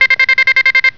TON

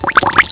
BUBBLES, WATER

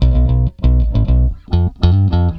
BASS, ROCK POP; MAGIX

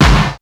IND SN, DRUM; BEAT2000

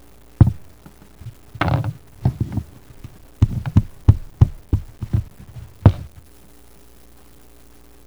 PULL NAIL

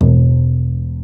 synth bass; symphoniepro; 16bitsamples

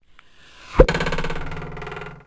ARROW

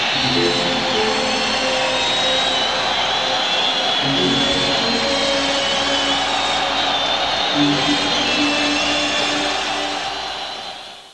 CHARGE; NCAA

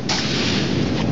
door open

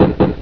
land jump

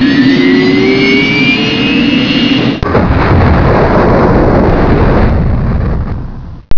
JET, PLANES; EFFECTS

